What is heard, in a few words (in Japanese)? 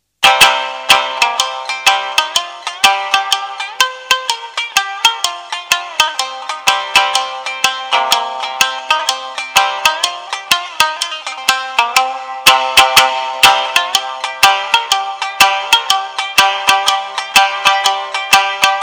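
tsugaru bayashi; 津軽三味線 民謡三味線; aomori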